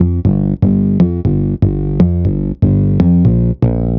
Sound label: Raw Bass; Sonic Foundry (Sony Creative Software); The Electronic Music Manuscript Collection; Bass Foundations